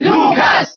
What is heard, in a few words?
Cheer German; Lucas; SSBB